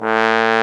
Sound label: BRS BSBONE, LOW BRASS; Roland - LA Composer vol. 1